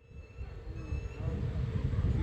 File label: Peregrine Falcon, in Guatemala City